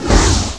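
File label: laser sabre; THEXDER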